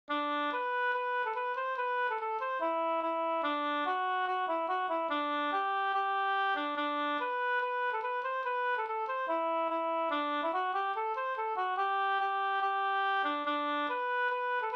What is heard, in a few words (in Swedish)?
schottis